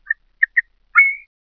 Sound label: bird